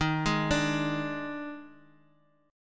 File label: Eb Major 7th Suspended 4th; arpeggiated; x,18,18,19; EbM7sus4